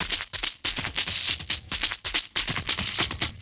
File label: Beat, Industrial